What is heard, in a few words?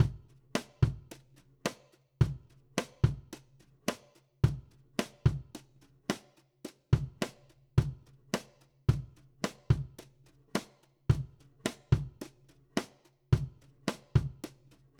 Country Drums (Verse 1 Alt); Digidesign; Pro Tools Essential Loops